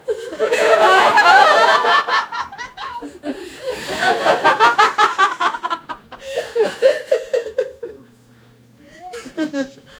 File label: realistic audience laught, theater